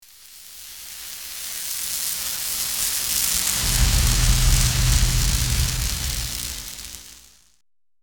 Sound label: lightning sound